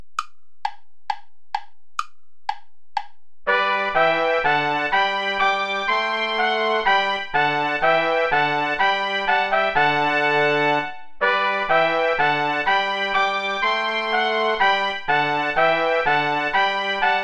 play along without trombone; schutz heinrich; wohl denen die wandeln

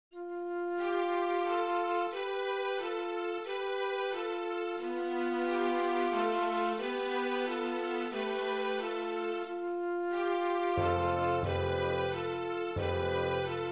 bitonal pieces for school orchestra; Robertson, Thomas

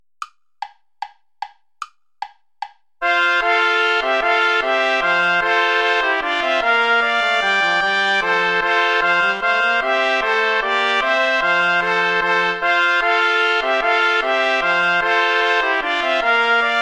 bourree play along without trumpet; praetorius michael iii

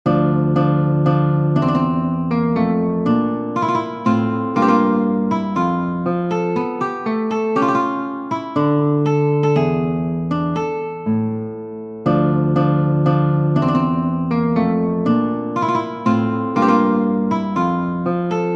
sarabande

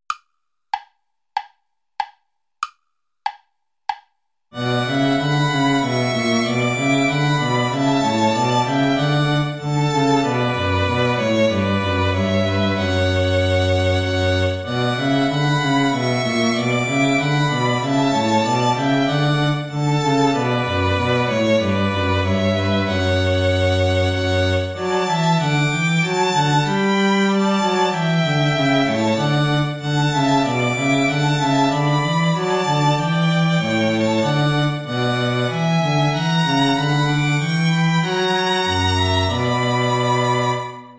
play along without viola

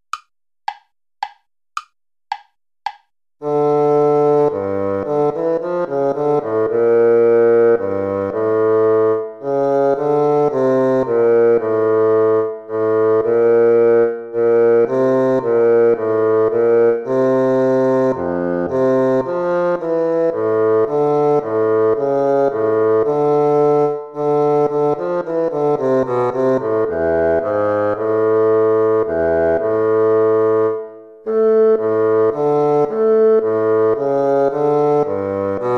courante play along; haendel georg friedrich

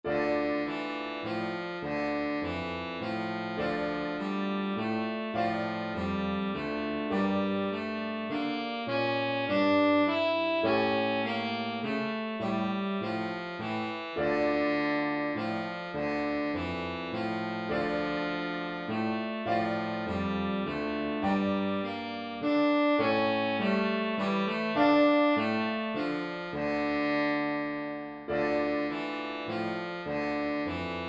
scale study in a minor key; lewis alastair

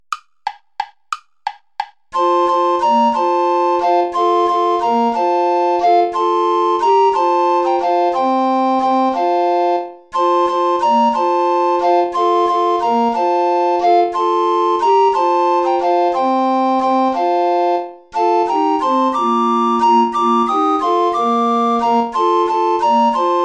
dir ist freude play along without alto recorder; gastoldi giovanni giacomo

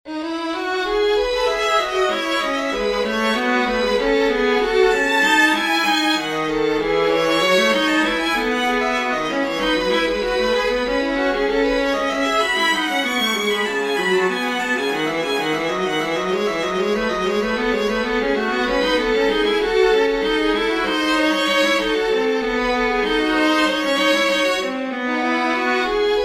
canon major for violin viola; clementi muzio from gradus parnassum

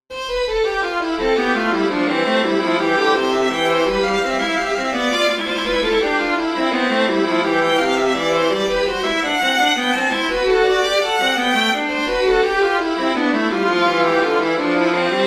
canonic variation for violin viola; bach johann sebastian; vom himmel hoch komm 039 ich her

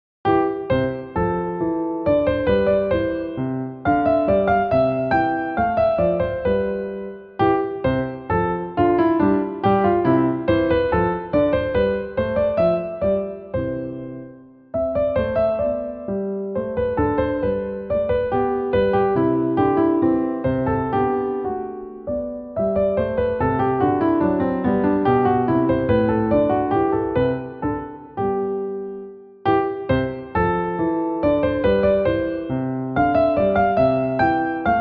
gavotte; boyce william

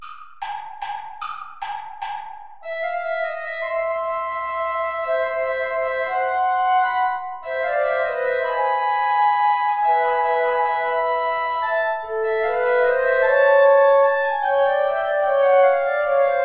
play along without guitar

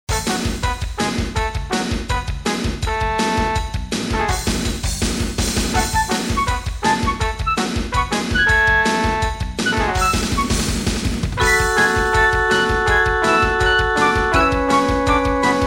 flute roll

